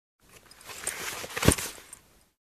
Lift and place pile of clothes

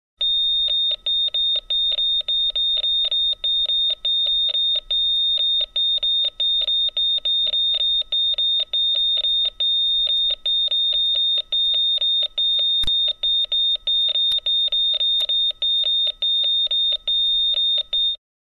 Medical Device detecting tone

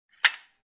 Construction Stapling

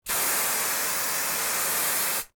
Hairspray

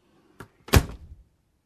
Kitchen Cabinet close

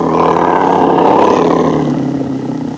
Bear Snarl

